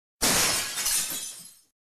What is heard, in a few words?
Glass Shattering